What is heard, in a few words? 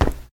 tile land; Golden Crayon Sounds